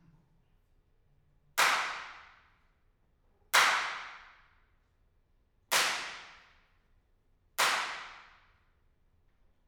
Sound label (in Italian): Clappatore